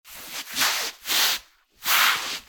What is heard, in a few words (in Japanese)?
畳の上をすり足で歩く音; walk